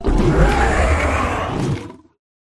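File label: Rat buff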